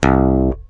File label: fingered bass note short; Basses